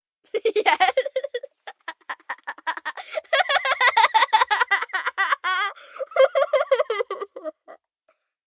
Laugh Giggle, Laugh, 1 girl, 10 yrs., having fun, human voice, child, kid, female; Laughter Sound Effects; Sound Effects:Voice; VoiceBox